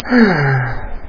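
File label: sigh of pleasure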